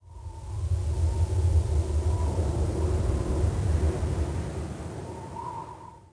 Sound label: WIND, wind